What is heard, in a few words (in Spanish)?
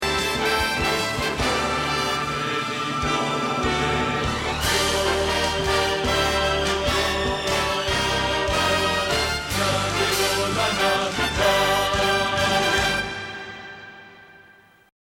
Bajo